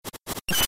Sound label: give object; ff3; ff3us